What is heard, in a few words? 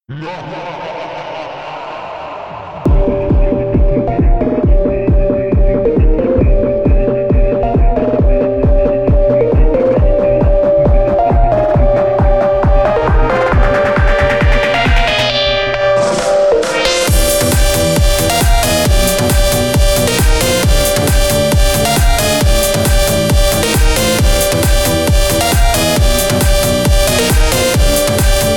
phonk x slap house